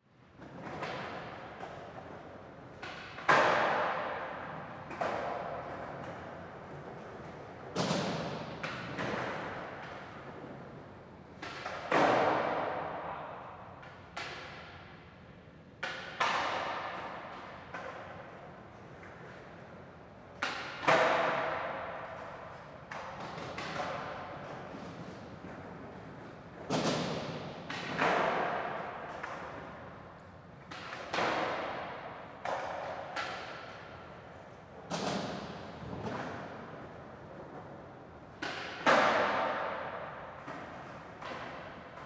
Ambience Skate Park Mic Up; AdobeCC

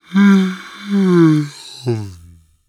Cartoon Human Male Giant Yawn

